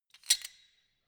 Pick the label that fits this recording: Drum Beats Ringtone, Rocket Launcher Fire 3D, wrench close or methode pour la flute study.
wrench close